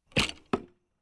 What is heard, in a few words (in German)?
Sport Bogenschiessen